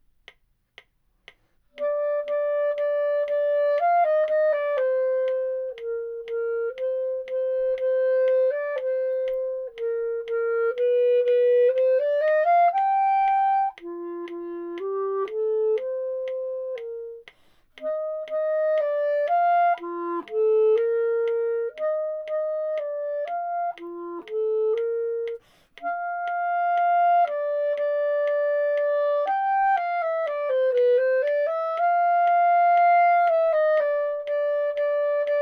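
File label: Metronome, Clarinet; Clarinet Student Resources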